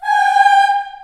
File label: G4 CHS EH, CHILD EHS, Choir; USB Soundscan vol.28; Choir Acoustic & Synth; AKAI